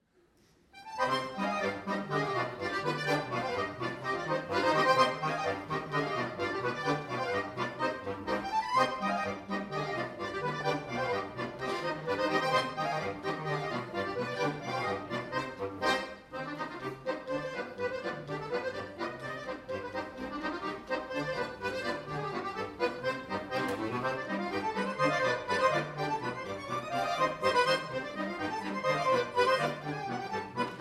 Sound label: Polka; Finnische Polka; Quartett